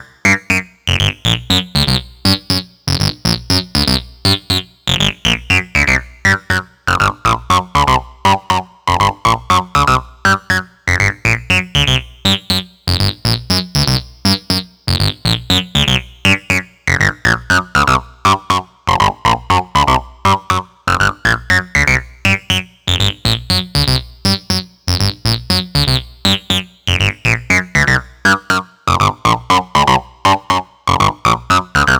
Filtered Sweep, Trance; Sonic Foundry (Sony Creative Software); Trance NRG VOL-2

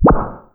DROPPING, Anime Sound FX; AKAI; FX SIGNALS

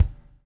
Bubble; Spectrasonics - Backbeat

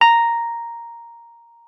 WUFF A#5, WURLITZER; Xsample VOL-1

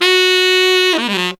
TEN F# LF, Phantom Horns TENOR FX; Zero-G

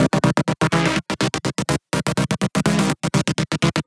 124 Cm, Melodic Loops; M8; Electronisounds

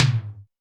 Tom Lo Blade, Alesis D4

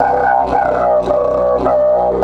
108, DIDGE 108BPM

